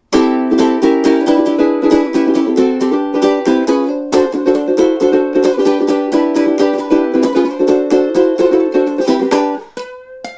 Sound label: strum test, ukulele; anuenue